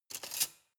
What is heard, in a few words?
cutlery large drawer grab